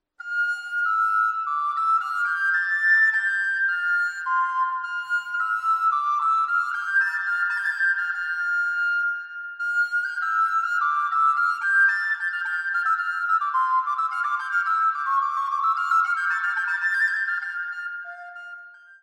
Petit Branle; Jacob van Noordt

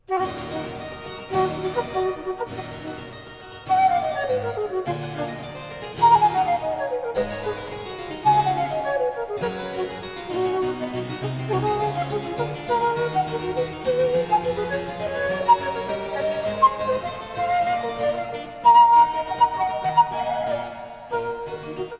Flute Sonata in E Minor Gayement

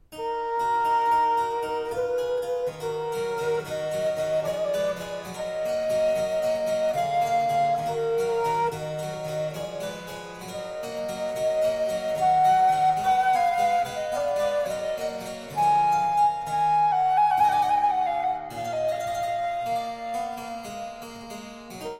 Flute Sonata in E Minor Gracieusement

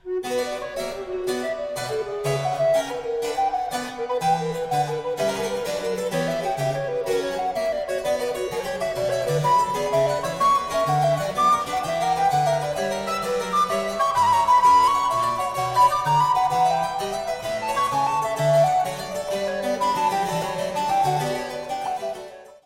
Flute sonata Allegro; BWV 1030

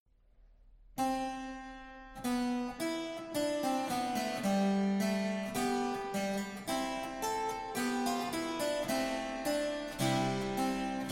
Fantasia a4 jonisch; Anthoni van Noordt; Vijfde

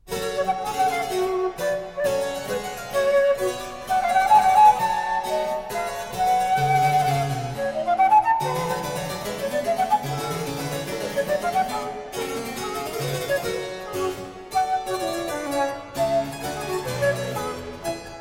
Flute Sonata, Gayement; No. 2 in G Minor; Op. 91

